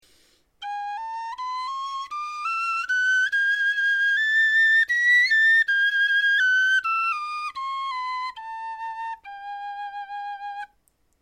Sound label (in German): tonleiter, knochenfloeten